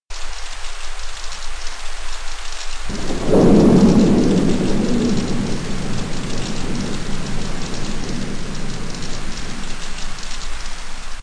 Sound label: rain light